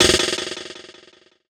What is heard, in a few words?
DRUMSHOT D+B 160, Drum+Bass, Singleshots; eJay